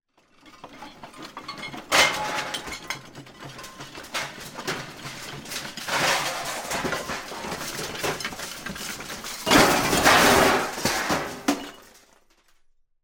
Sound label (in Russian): metallicheskie predmety padajut kuhonnye polki; Звук землетрясения в доме; Звук землетрясения в доме, когда трясется мебель, падает посуда с полок, звуки скрипа, гул